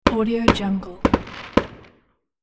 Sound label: fireworks